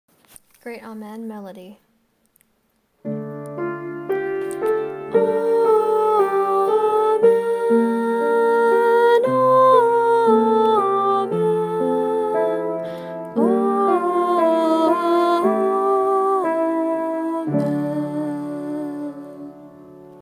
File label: Amen Melody